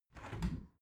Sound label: window open regular